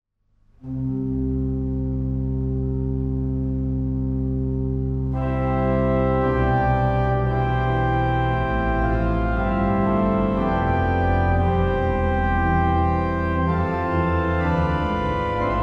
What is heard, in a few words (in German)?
Praeludium, orgel; Aulfingen